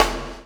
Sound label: CHAIN; Spectrasonics - Backbeat